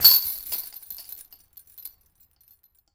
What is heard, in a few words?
DISC GOLF CAGE SOLID HIT; SPORTS